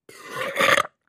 Nasty Stinky Airy B, belching

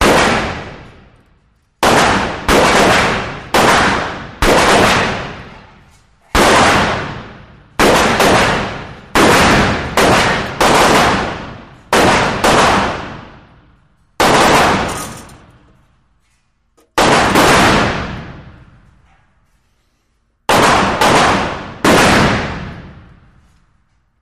Gun Shot Multi; shots

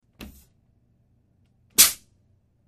Oxygen Valve Button, air

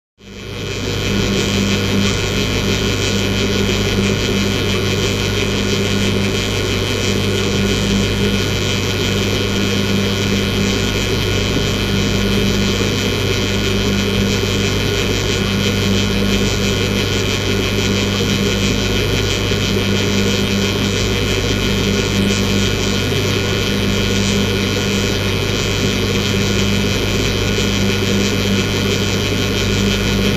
Electric Motor Light

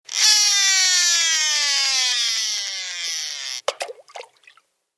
Fish Reel Cast Lng Out, fishing

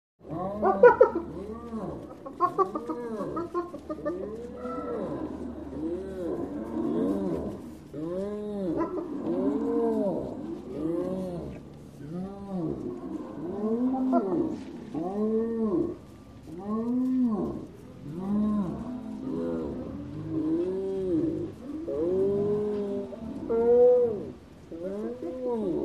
Hyena Spotted Laugh

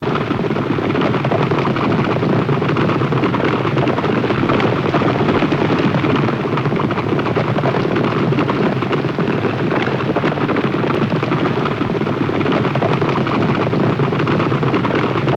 Horse Grp Galloping

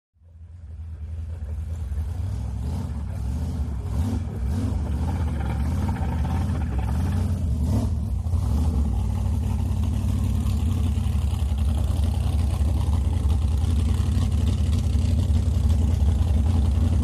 Drag Boat Start Ambi

